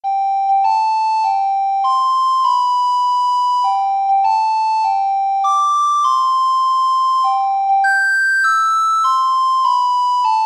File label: happy birthday, recorder